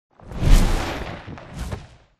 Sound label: flyer perched takeoff attacked med dist; boneyard; Titanfall 2